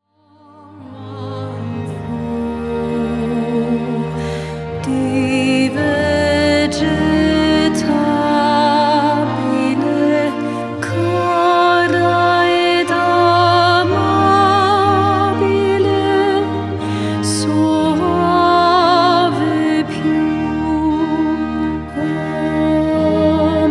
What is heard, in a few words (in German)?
zweite Stimme; Ombra mai fu